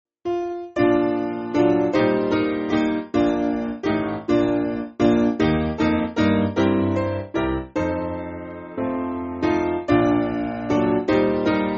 It Came Upon Carol SPiano

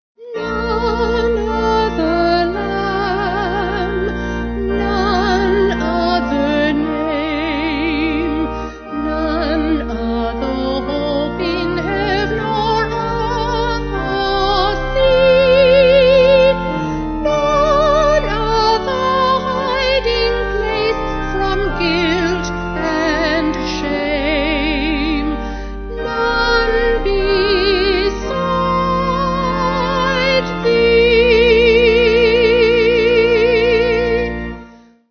Vocal Organ

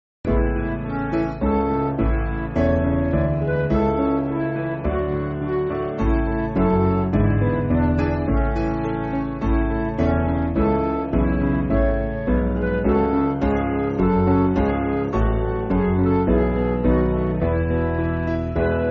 Midi; Regent Sq

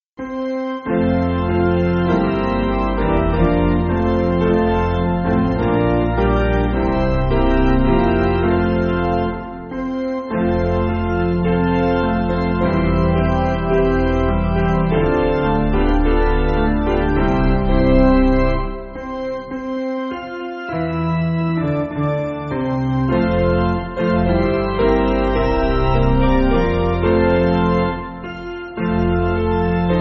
Ive Turned My Back OPiano

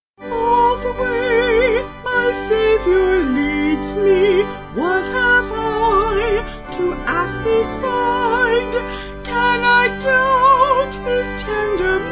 Vocals Organ

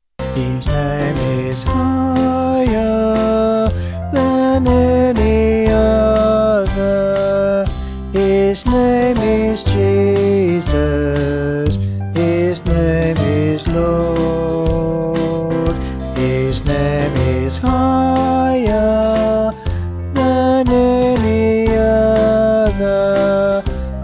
Vocal Band

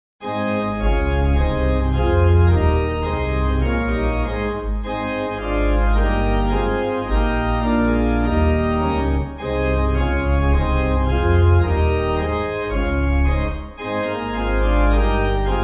Pipe

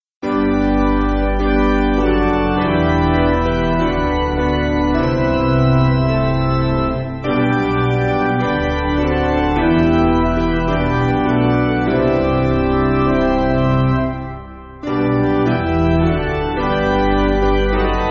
Piano, Music Backing; Fling Out The Banner; Cathcart

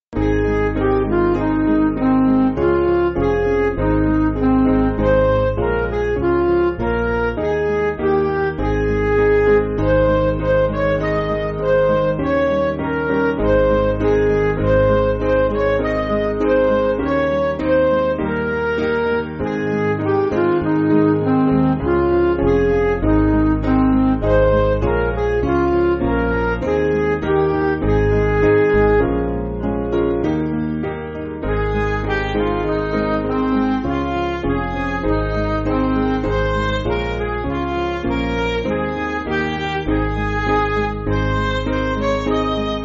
Midi

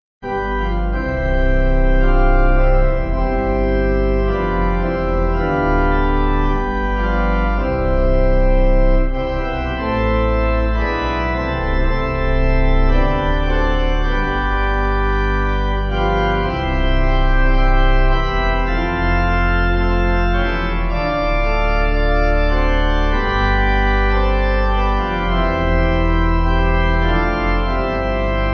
When Peace Like A River, Organ

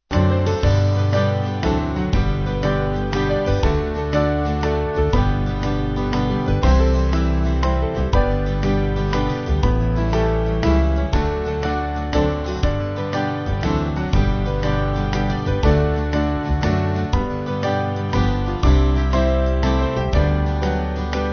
To God Be The Glory, Band; Small Band; 3/G-Ab Swing Version

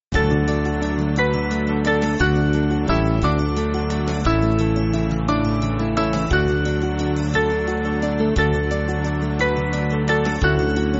Band, Music Backing Files for Churches

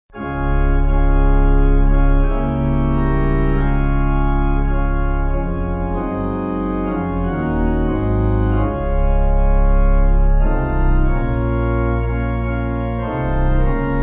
Pipe; Beneath The Cross; St Chris; Beneath the cross of Jesus I fain would take my stand

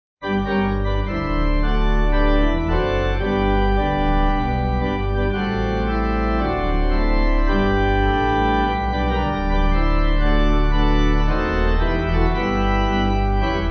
Pipe

